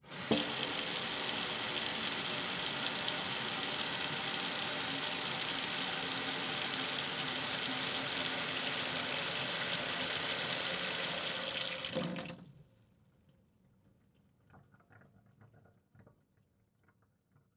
Sink Kitchen Sink Faucet Turn On Shut Off, Household